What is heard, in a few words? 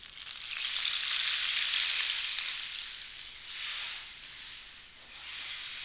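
rain sticks; Spectrasonics; Heart of Africa 1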